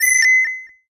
8bit death

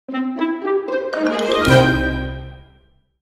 Victory; Neighborville

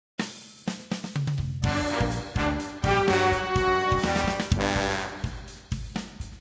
Battle

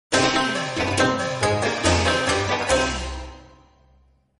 Victory; Journey To The West

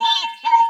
Reload; Wizard Mini

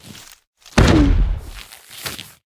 Sling Pea Lob

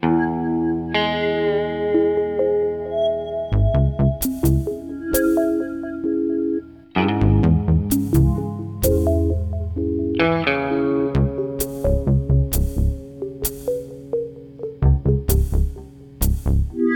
loading

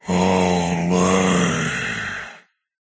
Cowboy Groan

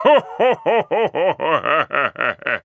Wizard Laugh

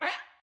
Sheep

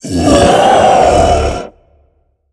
Streaming Waves

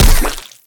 peashooter pea cannon core mono

